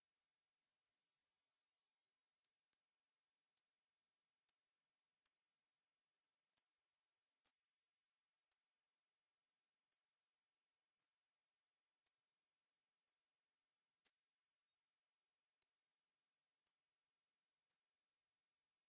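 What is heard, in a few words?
Chestnut-eared Bunting; hooaka; nasumachi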